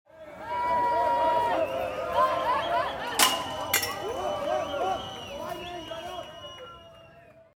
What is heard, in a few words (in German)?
Atmosphaere